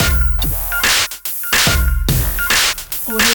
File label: 72 bpm dirty logistics; soundmorph